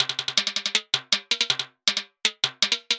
timbales perezvon

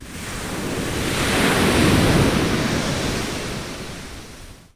okean aktivnii shumnii

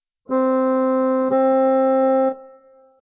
organ interval

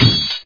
kuznec kuet bogatirskij mech

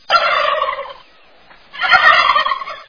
indeika aktivnii blizkii zvonkii v lesu

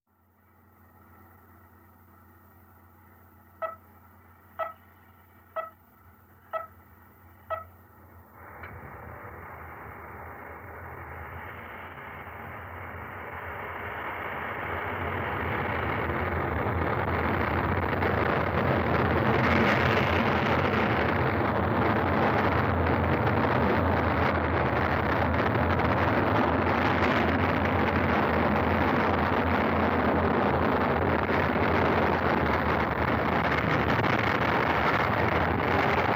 zvuk starta kosmicheskoj raketi

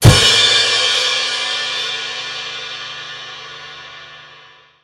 dlinnij zvuk udara po tarelkam barabana

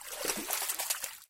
priyatnij shum volni byushejsya o bereg

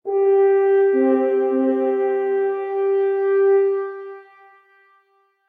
torzhestvennoe zvuchanie odinokogo gorna

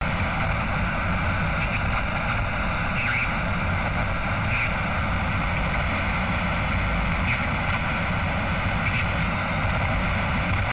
pomehi na radio

